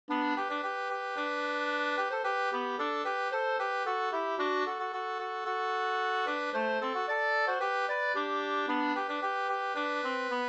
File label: polka